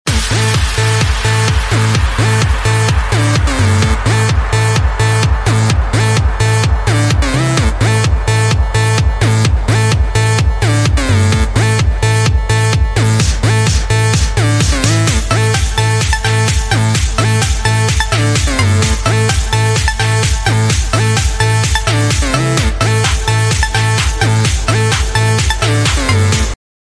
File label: Bounce Ringtone